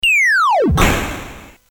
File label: BASIC sid CSG8580R5, GAMES; Stack 64 Simon